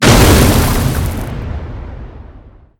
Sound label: impact ground heavy